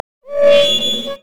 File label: brazier chains groan